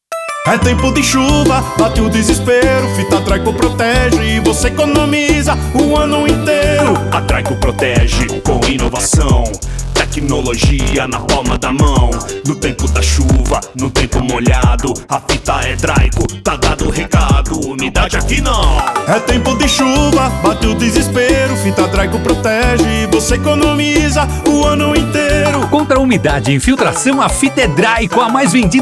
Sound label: JINGLE; DRYKO